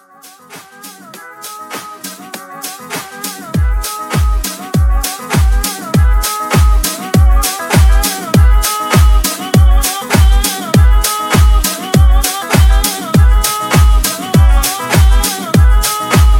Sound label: Transition 105 120